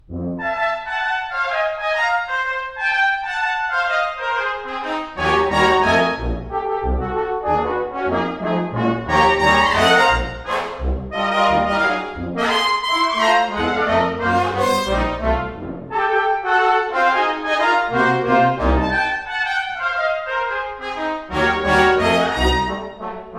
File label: fanfare and hustle